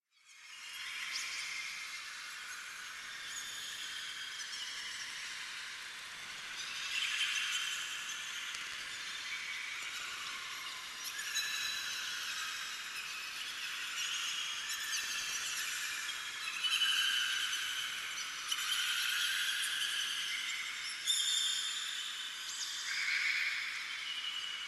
Bird Ambience In Cave Heavy Bird Chirps and Squeaks; Hollywood Edge; sound designer's toolkit v2